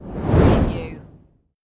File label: Fireball Pass By Whoosh